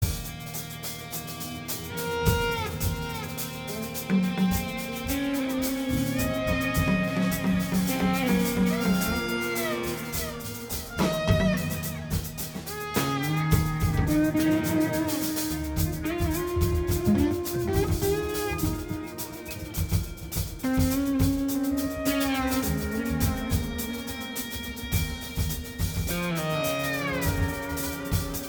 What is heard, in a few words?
Jam Drums